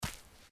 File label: biped run